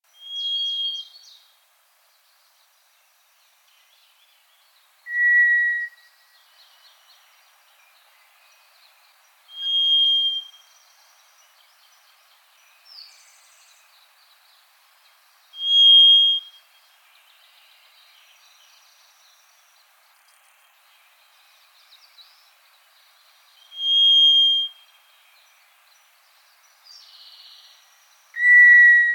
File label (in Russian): svist pestrogo drozda